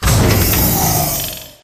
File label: Delivery Cage Lower; Titanfall 2; Boomtown